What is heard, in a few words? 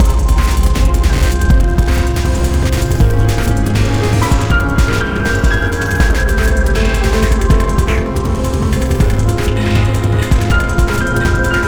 Waterworks Murky Medium; rive wreck hack die retry; ps4